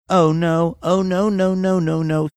singing oh no oh no no no no no

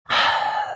sighing

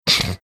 snorting

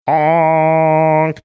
hooooonk

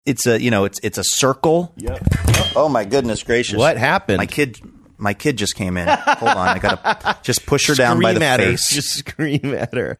bumped microphone; blamed kid

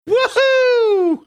whoo hoo

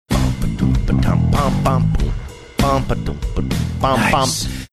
singing along with funky bass saying nice

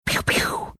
making finger gun shooting sounds

